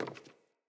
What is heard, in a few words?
Sandals Walk Wood, Footsteps